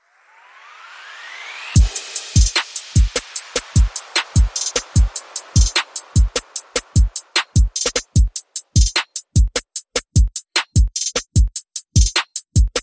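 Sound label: Percussion and FX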